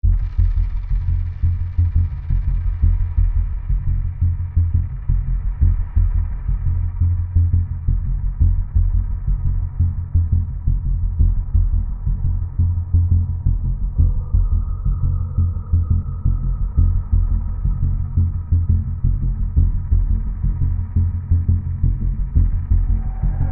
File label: sync bass thunder